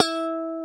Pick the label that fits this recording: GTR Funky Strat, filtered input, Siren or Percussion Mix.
GTR Funky Strat